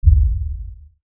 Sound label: LFE Hit, Lfe Hit Short Impact; Hit Bass Digital Drop Production Element Imaging Element Accent Transition